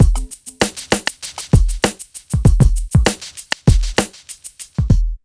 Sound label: 98 BEAT, Hip Hop R n B; USB Soundscan vol.19